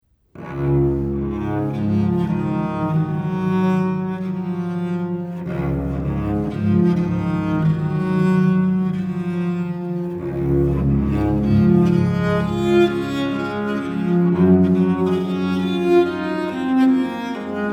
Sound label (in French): Les pianos